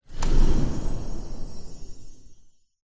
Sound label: main scene loaded; Recode; rampage riches Desktop